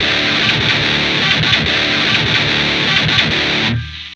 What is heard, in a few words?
Guitar